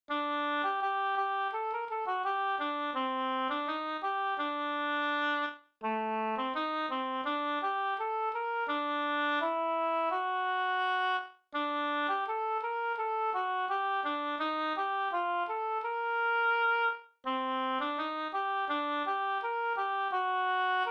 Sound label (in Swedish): vismelodi